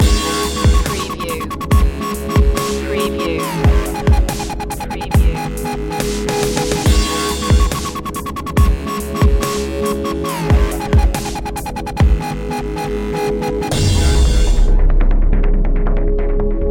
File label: deep impulses loop